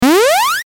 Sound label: powerup; MagicMayhem